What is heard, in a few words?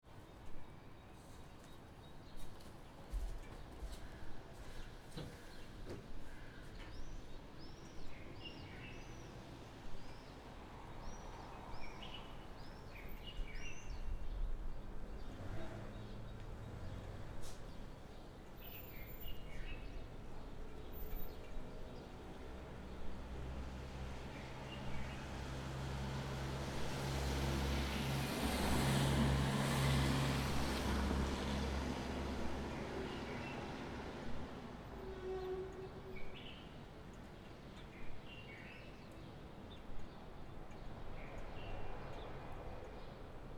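5am small town light rain thunder; atmosphere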